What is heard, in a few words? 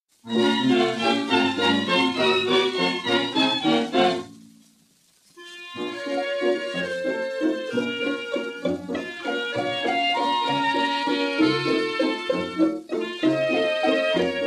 waltz broken strings